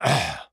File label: Hurt; Batman